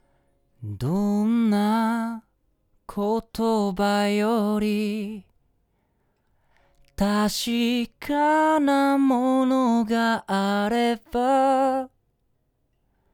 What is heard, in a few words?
AKG C414ULS